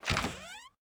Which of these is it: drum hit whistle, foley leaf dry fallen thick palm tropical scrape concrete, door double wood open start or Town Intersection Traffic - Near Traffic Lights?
door double wood open start